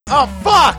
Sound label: rage; w00tguy; arcade